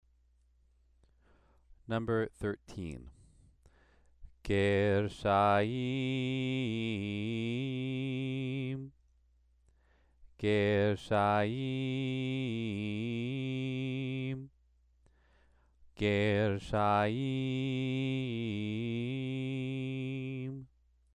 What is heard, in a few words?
Torah trope exercise